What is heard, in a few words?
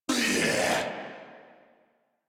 Death Corrupted Grineer Male